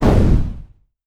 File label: Attack Swing